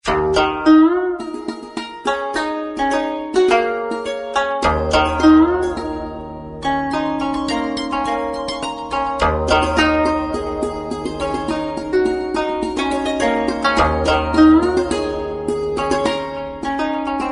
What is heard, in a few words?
Pentatonic, ringtones; Magic